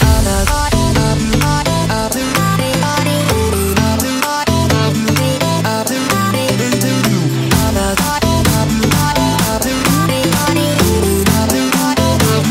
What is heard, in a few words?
electronic